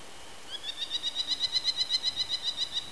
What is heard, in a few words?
eagle